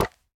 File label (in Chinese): 树脂砖击打声